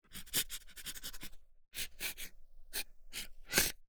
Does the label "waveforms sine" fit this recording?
No